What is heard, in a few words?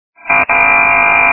fail